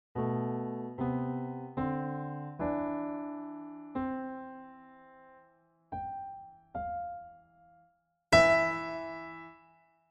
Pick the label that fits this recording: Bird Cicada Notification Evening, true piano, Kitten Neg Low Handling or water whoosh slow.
true piano